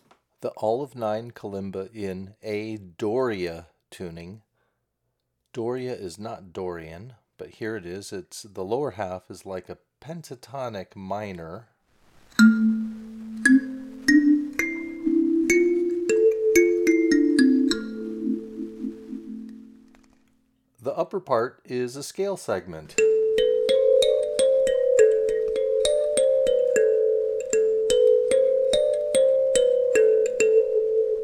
Olive Tuning, kalimba, A Harmonic Ake Tuning; Olive-9 Kalimba; Magadi Olive-9 Kalimba; This tuning is like the A minor Ake Bono tuning

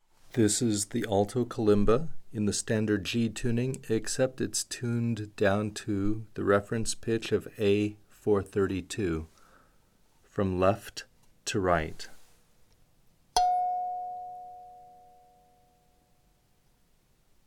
alto G 432

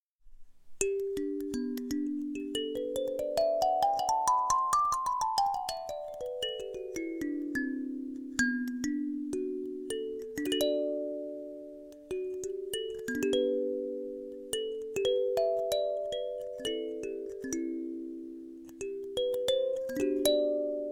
Treble Celeste, kalimba

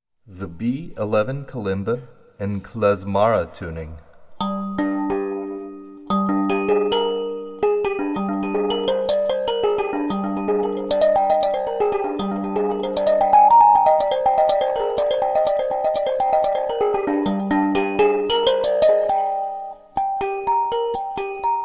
Klezmara, kalimba

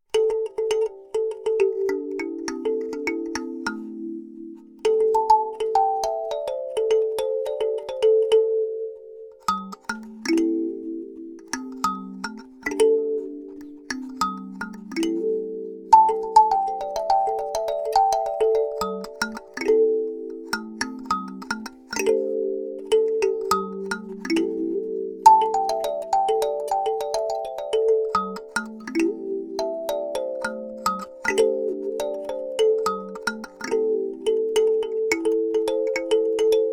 Alto Evil, kalimba

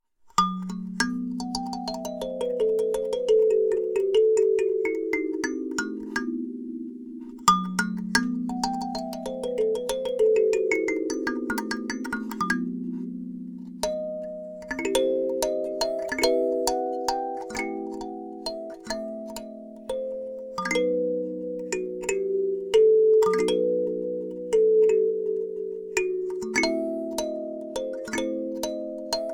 Alto Mid East, kalimba

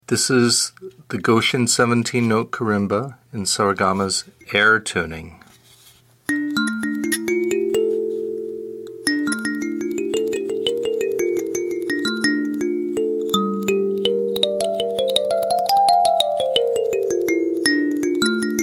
karimba air; goshen

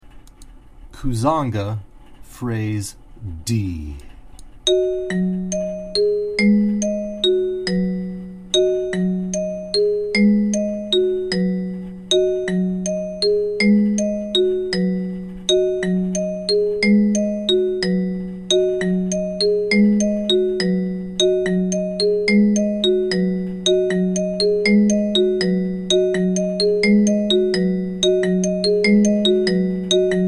Kuzanga Prog D, kalimba

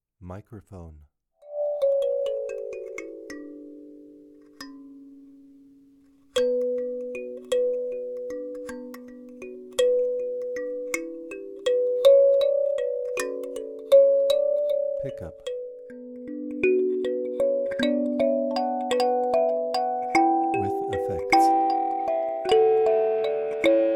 D Treble, kalimba

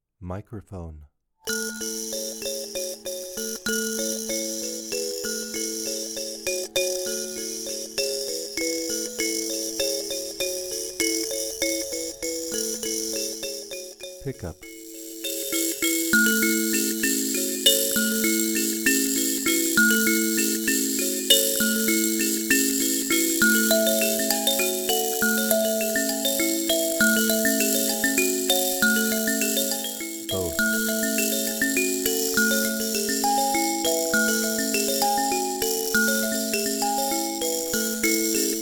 Karimba African A

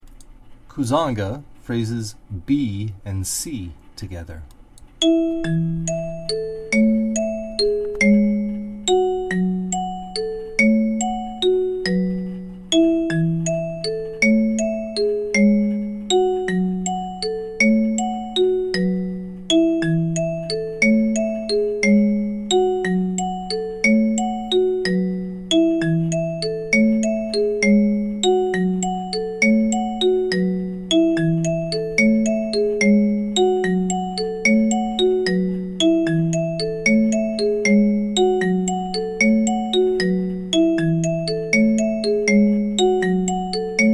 Kuzanga Prog B C, kalimba

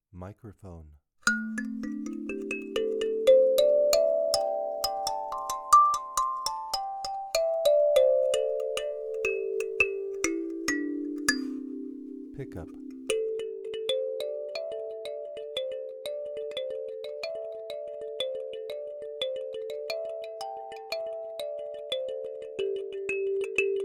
Treble Evil, kalimba

